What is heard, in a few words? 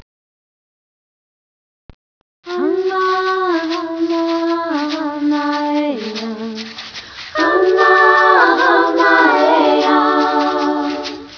LULLABY